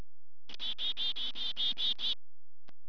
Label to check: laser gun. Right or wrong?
Wrong